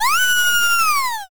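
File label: scream female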